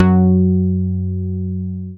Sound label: Dance Floor Bass, Multisample Basses